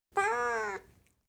Siamese, Individual Meows, Cats; BBC Sound Effects Library

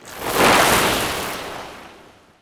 Magic Spells Cast Short Ice General; David Dumais Audio; Ice & Frost Magic 1

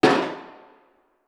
Metal Strut; 99Sounds; The Warehouse

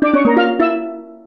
jingles STEEL; Kenney's Sound Pack; Music Jingles; Steeldrum

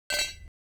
Hit Kit, Hong Kong Action Kit; NoizBoy; Hit Kit 2

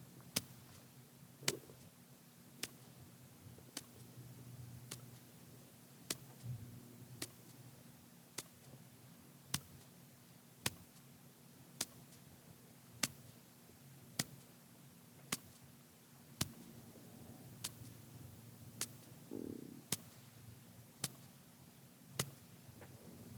Hand Skin Fingers Pat Soft, Hand Body Foley; Joshua Reinhardt; Ultimate Hand Body

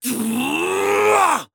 Man, Anger Fight Grunt Special Power; Articulated Sounds; Fight Vocalizations; EMOTE

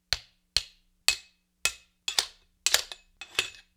Axe Sabre Blade on Hilt; StillNorthMedia

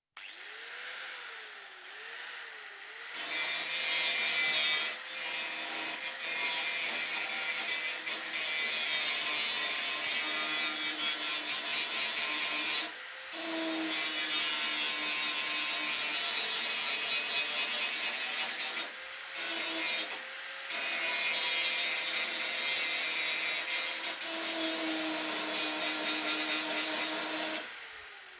Electric Grinder, Long Bursts; Construction; BBC Sound Effects Library

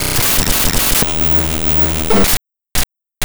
Rhythm

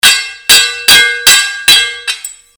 Sword Sound; FXHome; Fighting Sounds

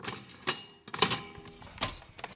toaster; Sound Design Tools; Raw Foley

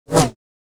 Saber; FXHome

